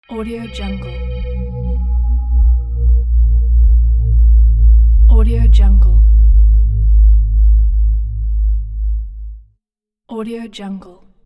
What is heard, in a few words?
long sub